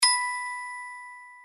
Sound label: New Message; TP-LINK Neffos C5